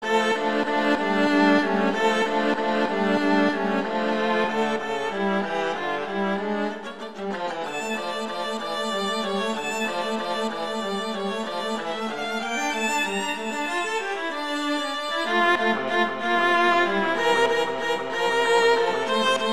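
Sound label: Duet, Andantino, viola; Mazas; Duets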